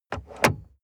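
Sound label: sports car interior glove compartment open soft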